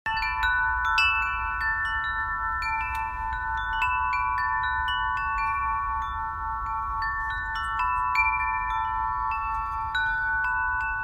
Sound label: Seconds of Windchimes